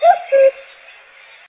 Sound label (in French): coucou